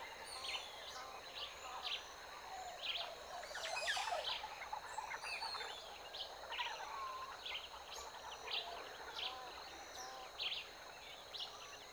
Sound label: Jungle Ambience